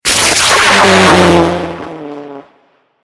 Rocket Launch Small, Missile; Rocket, Launch, Small, Missile, 5.1; Sound Effects:Science Fiction; BlastWave

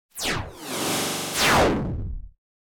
Laser Whoosh Front To Rear To Front; Laser Sound; Blastwave

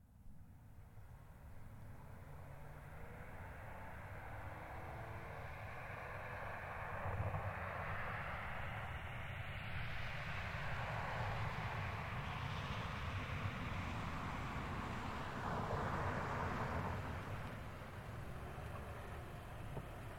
Car Highlander Up Stop Up Dirt; 2005 Toyota Highlander V6; Cars; Vehicles; Big Room Sound

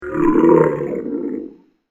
This Dragon Aint Happy, monster

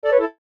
Notice Light; Buttons; Multimedia; Blastwave

